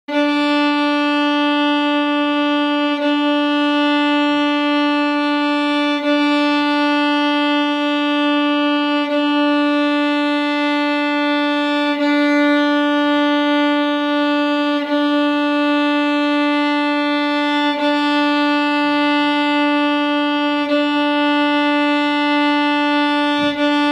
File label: D Tuning Note